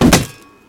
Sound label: repair wood